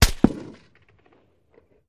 Gun Shot Sngl Left

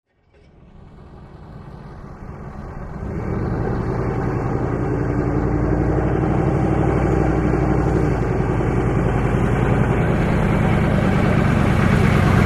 Humvee Very Slow By

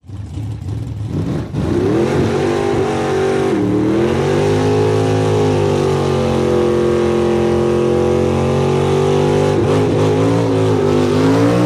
drag race burnout, dragster

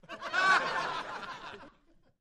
Crowd Short Burst, small int

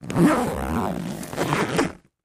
Bag Zipper Open; zippers

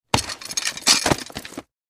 Chain Metal Cloth Mov

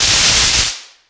Elect Arc Fade, arcs & sparks

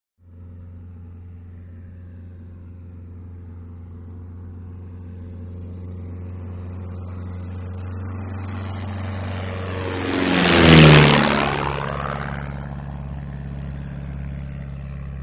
Tiger Moth By Whine

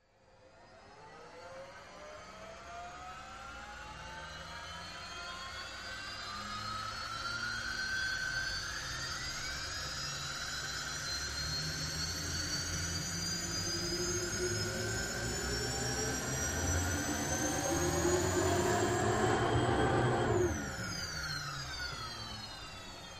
Hydroplane Interna

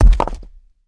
Rock Dirt Hvy Clear; rocks & dirt

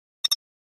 Button Load Beep Ta; buttons & beeps

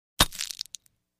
Bulb Flash Mix Glass, flash pops

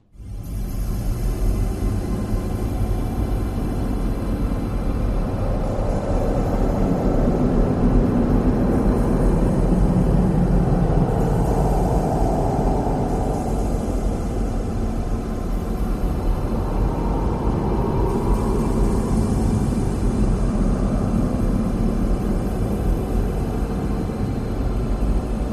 Dimension Soft Wind Ton; air

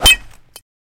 Bullet Impacts, metal

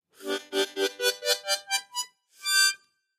Mouth Organ Ascendin, harmonica; woodwinds

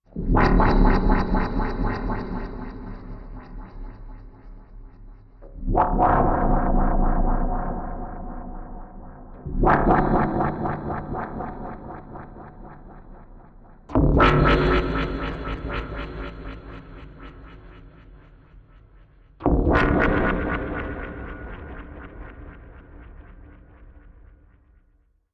Process Attenuator, space beeps

